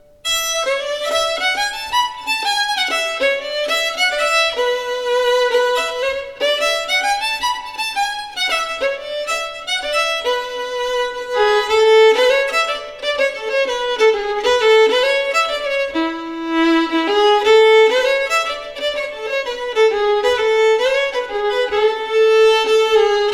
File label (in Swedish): polska; Omas Per polska 393